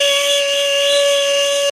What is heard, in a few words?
ney re